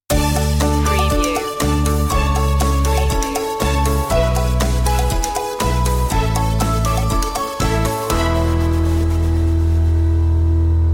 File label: walking on air stinger